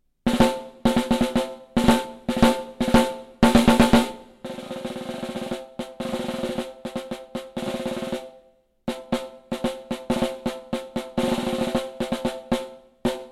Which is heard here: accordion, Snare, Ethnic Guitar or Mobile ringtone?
Snare